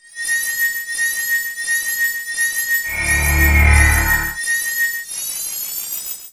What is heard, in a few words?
chest appear; zelda